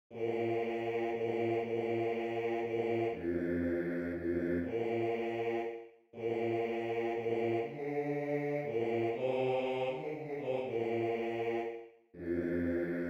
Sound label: Bass II; Apparuit